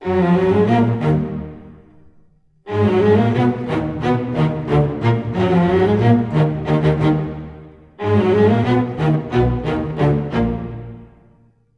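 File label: D# minor, Low Strings, 90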